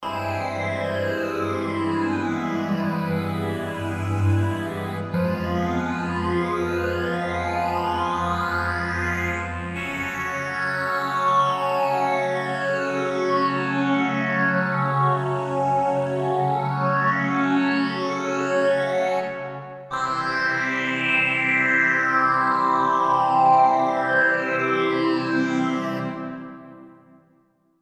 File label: Arp Quadra Polyfiltersweeps